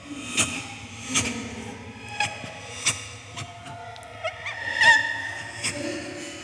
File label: ghost; EnvSound